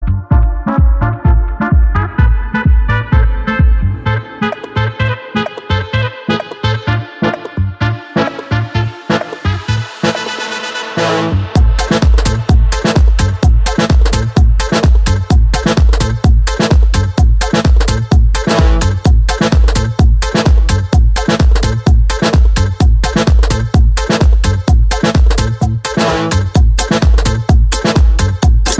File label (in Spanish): fiesta electronica